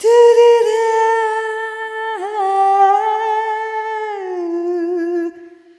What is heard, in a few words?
Arrhythmic F